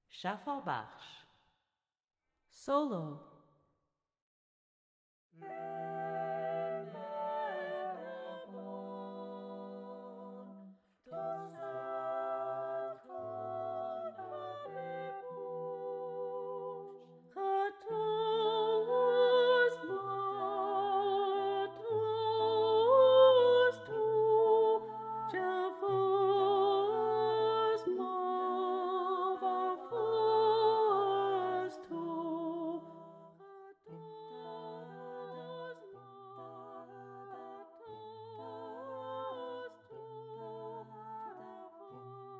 solo, choral music

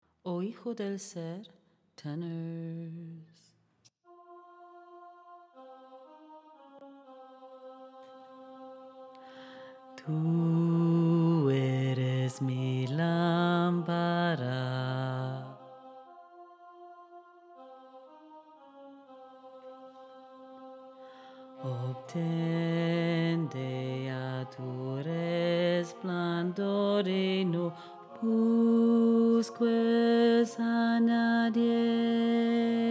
tenor

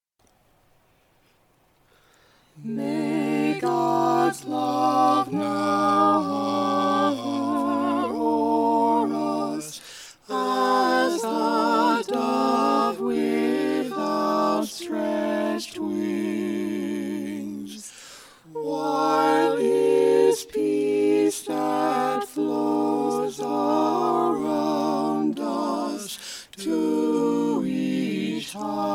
tutti voice; benediction

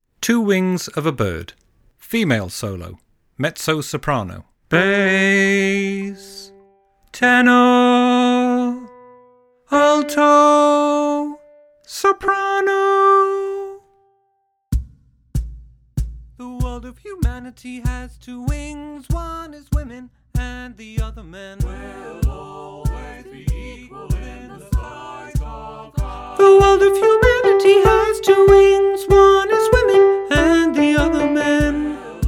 solo (female), choral music

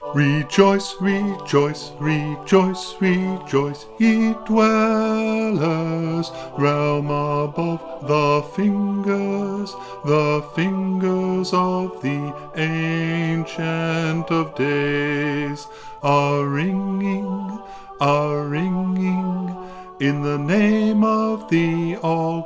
bass, choral music

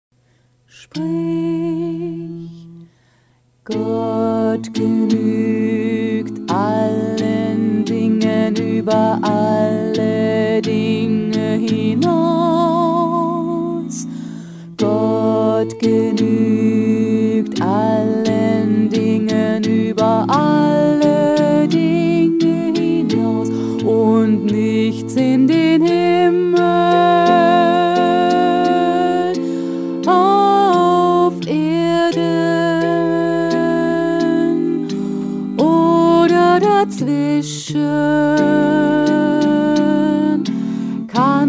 bass, choral music

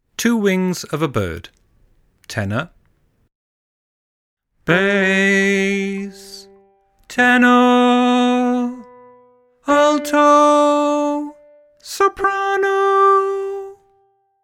tenor

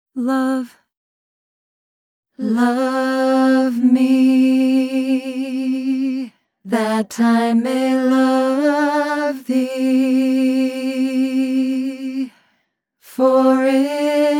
love me, alto, Baha'i Choral Music

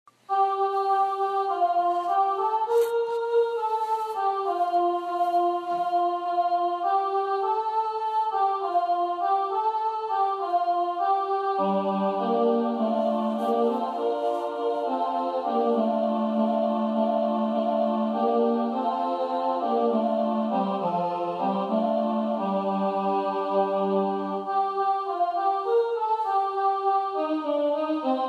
bass

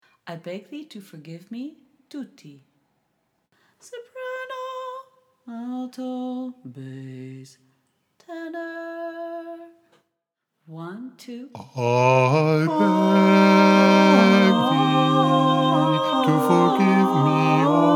tutti

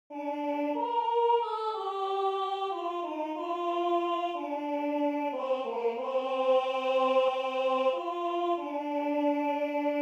tutti, Choral Music

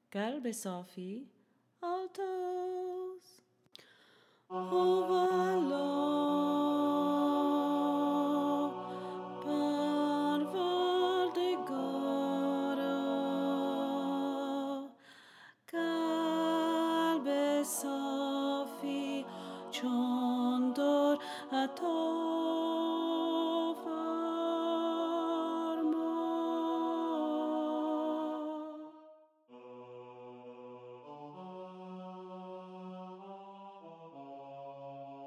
alto, choral music

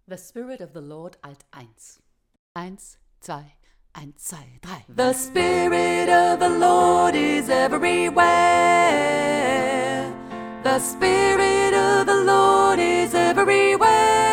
alto

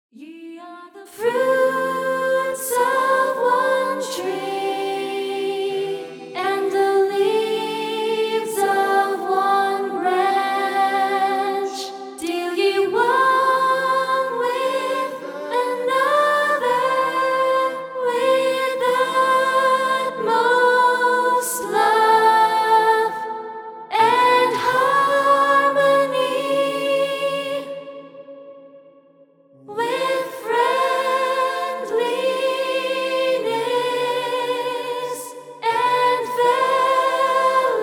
soprano; fruits of one tree

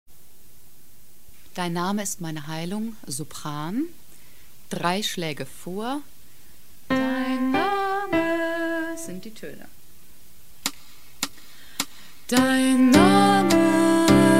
soprano; dein name ist miene heilung

